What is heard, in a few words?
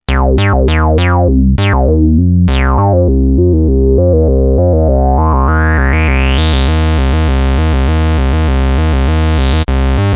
ResoBass